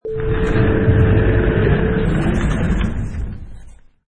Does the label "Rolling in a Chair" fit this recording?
Yes